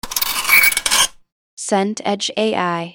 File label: box cardboard drag